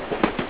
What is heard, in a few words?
CHUG